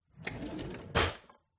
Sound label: kitchen drawer open